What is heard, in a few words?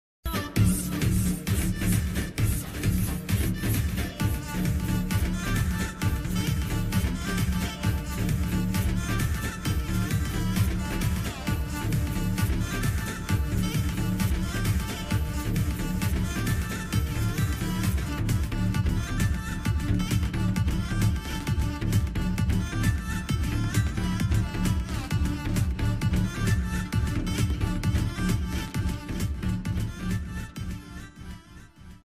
song bgm ringtone